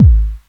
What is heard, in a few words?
bd House; e-LAB